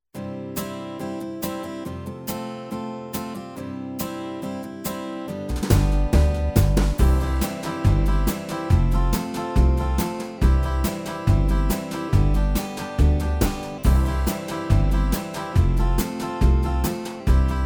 Country Celebration

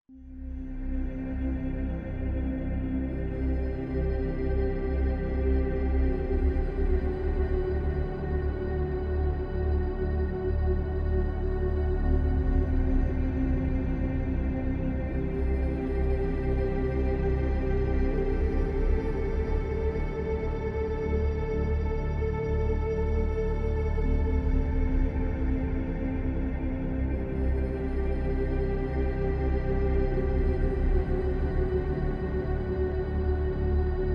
Techno Isolation; Alone, at the highest point on Earth, cut off from the grid.

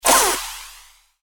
Laser Shot

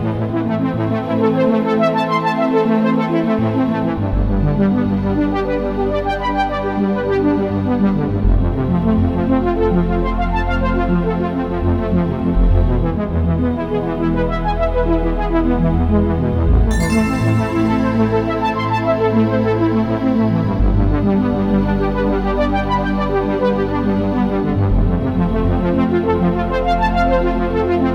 Dragon Mystery, fantasy

